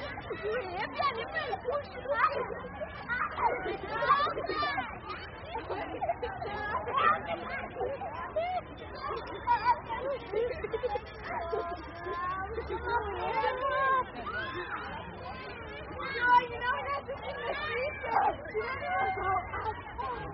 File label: Nursery Kids Playing Outdoors